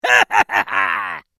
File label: Mobile game character laugh; video game